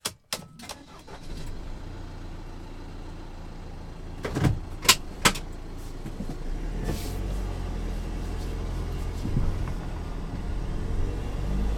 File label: van start drive speed bumps internal mercedes sprinter; transportation; sound effects one